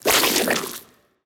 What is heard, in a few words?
attack; gurglings